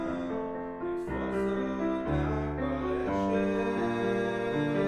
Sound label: Room L